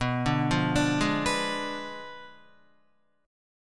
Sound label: B Diminished 7th B down Guitar; Bdim7 arpeggiated